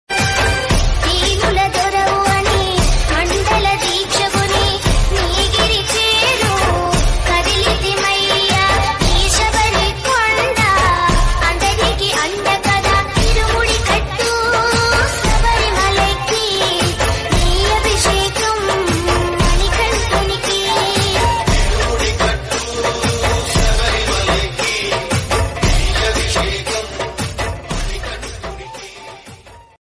song ringtone, lord ayyappa swamy

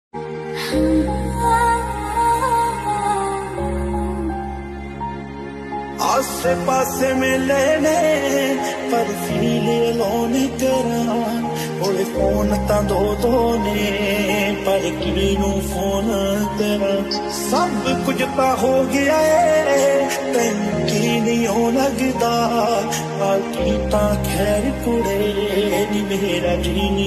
Punjabi ringtone

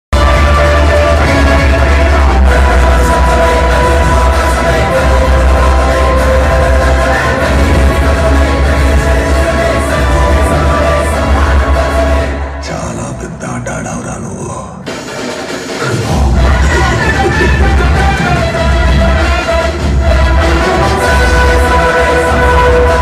interval bgm ringtone; saripodhaa sanivaaram movie

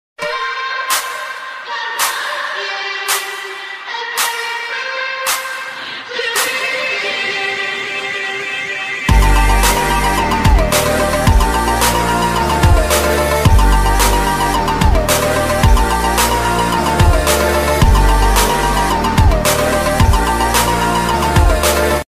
bgm ringtone english ringtone

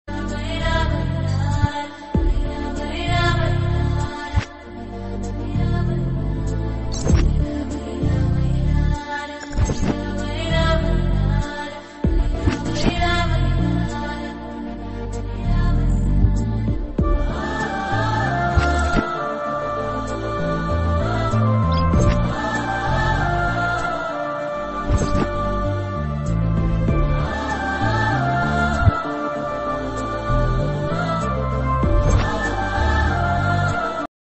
emotional sad cry bgm ringtone; ntr

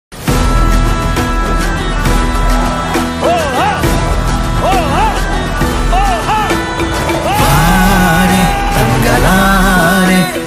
bgm ringtone, tamil ringtones; Chiyaan Vikram

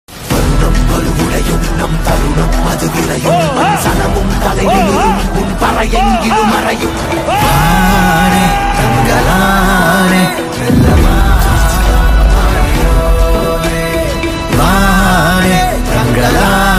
trailer bgm

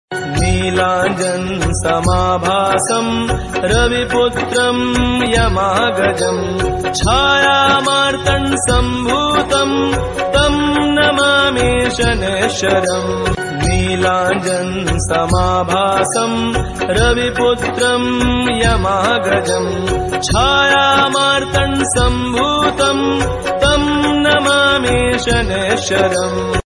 mantra devotional ringtone; Shani Dev

